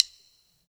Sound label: STICK